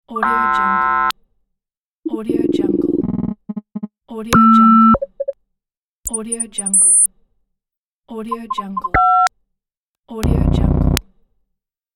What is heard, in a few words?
interface bleeps pack